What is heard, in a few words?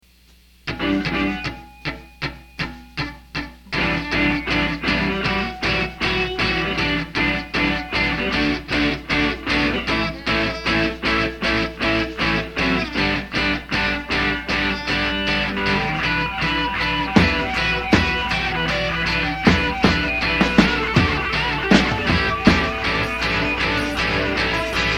Trio; JSJ Trio